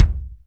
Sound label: kicks